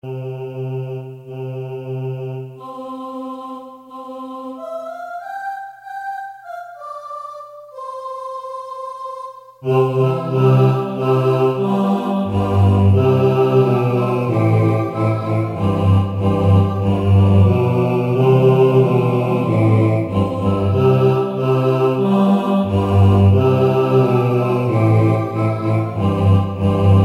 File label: BASS